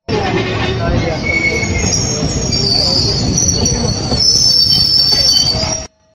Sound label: train brake; ترمز قطار